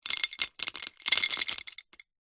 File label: food savory snack wasabi peas in ceramic bowl handful drop in; zapsplat